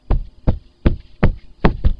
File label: steps